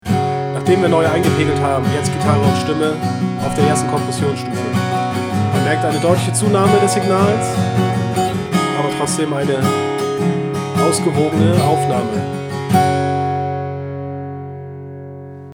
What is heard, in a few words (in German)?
instr stimme kompr; apogee hypemic